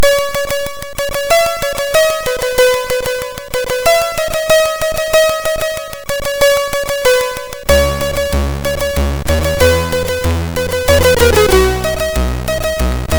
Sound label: Piano Fun, sid MOS6581R3